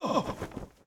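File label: incubus damage; monster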